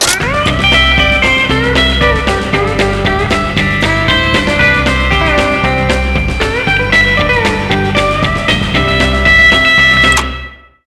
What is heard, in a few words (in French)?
mus poste radio western; rayman raving rabbids 2 wii windows gamerip 2007